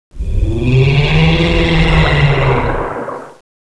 grab tube; tubequeen

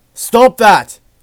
shot

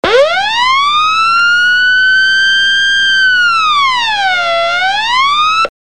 sub collision alarm

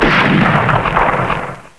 sniper fire last round

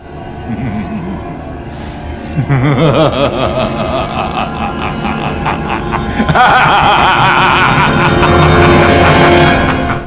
laugh; sven

